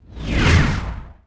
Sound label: rocket missile passby; weapons